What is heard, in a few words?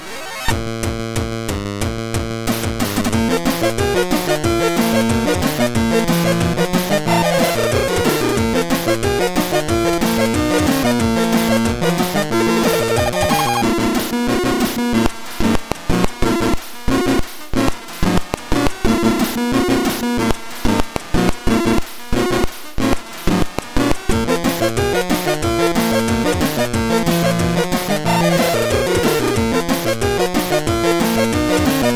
Battle, sid MOS6581R3; Final Fantasy 6